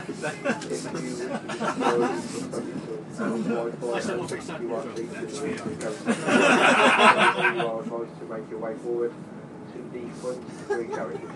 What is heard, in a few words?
ambience laughter and conversation on a train